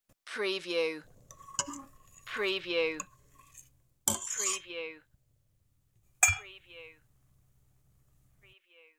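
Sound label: Spoon Metal Pot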